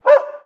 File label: Dog Bark Five; DogBarks 1.0